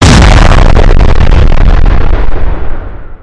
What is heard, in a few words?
smash; the monster plane; kolmods